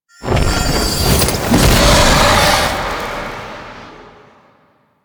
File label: Struggle Fail; Warframe; The New War; Archon; Boreal